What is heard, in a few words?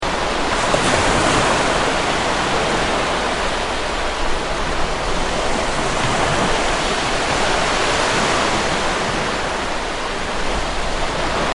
Beach; hiberworld; android